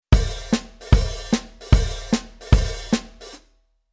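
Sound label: Rhythm 2beat Hi-Hat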